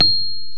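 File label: piano Rhodes Mk.V; Roland LCDP10 Keys of the 60s and 70s 2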